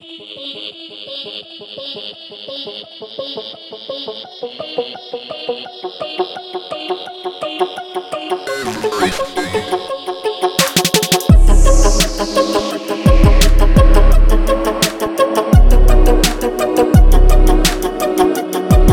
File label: TRAP; Zampler RoboTxtr